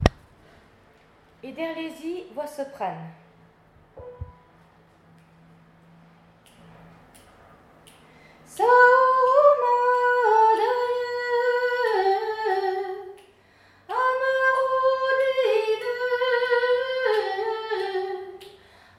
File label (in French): Voix Soprane